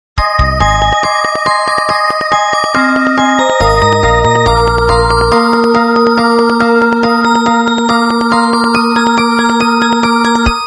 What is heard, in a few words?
tone; O2